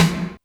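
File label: Snare Hi Gated, Alesis D4 drum machine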